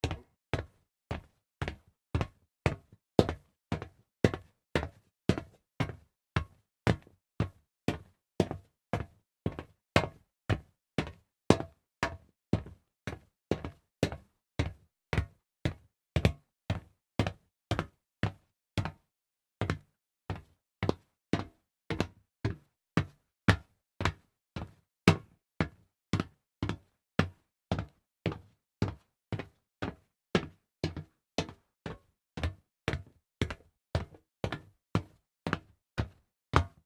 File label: walk on solid metal series, foley